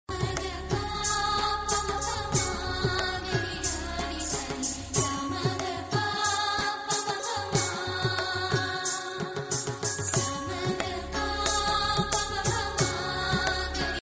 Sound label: huawei mate 10 ringtone